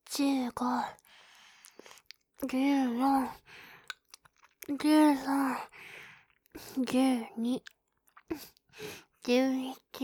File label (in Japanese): カウントダウンボイス3フェラ